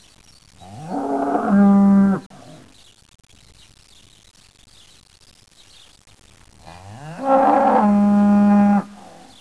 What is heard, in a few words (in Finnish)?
lehm; suomenkielistä